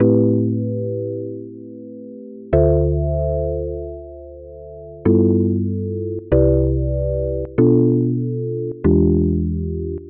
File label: jazzy rhodes style synth loop 95 bpm 4 bars am